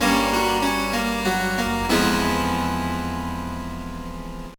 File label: Jingle, gba; Mermaid Melody Pichi Pichi Pitch Pichi Pichitto Live Start